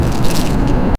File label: gentle rustle of leaves, plant movement